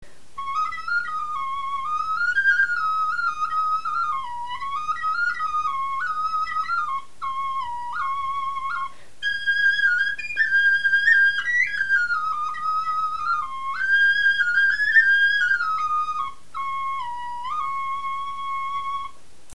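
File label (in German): melodie, Knochenflöten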